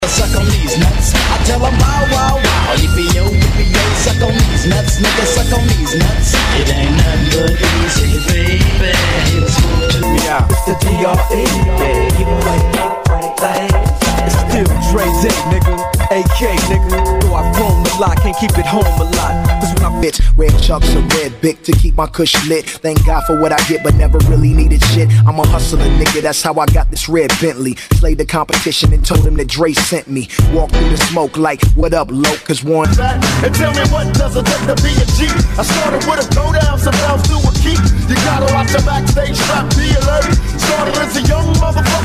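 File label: Old School Hip Hop Megamix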